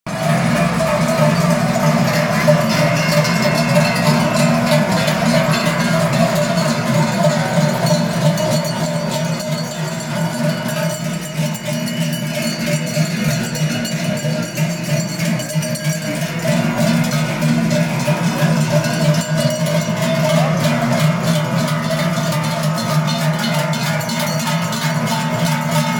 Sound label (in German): trychler glocken